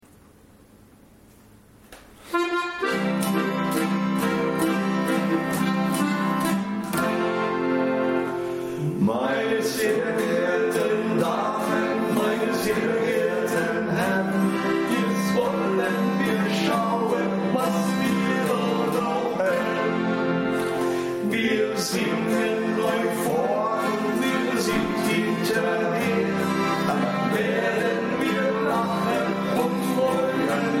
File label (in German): Faschingslied gesungen